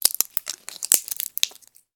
household medicine blister pack pierce open; sound effects one

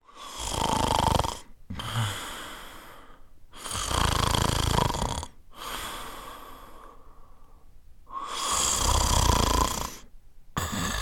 human male snoring

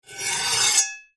knifes medium scrape blades together

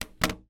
office calculator down on table in office

